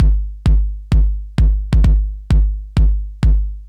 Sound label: Control Kicks, 1A, Jungle Breaks; Blu Mar Ten - Jungle Jungle - 1989 to 1999 Samplepack